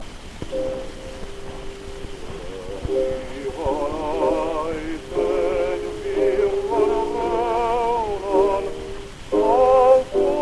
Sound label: Gramophone